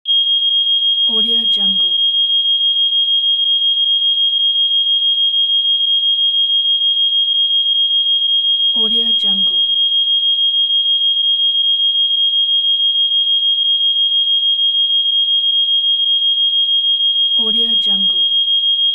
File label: fire alarm